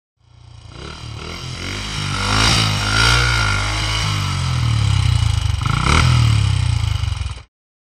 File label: Stop Off BSA Single, Birmingham Small Arms